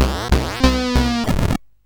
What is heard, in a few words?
Fire Breath, CSG8580R5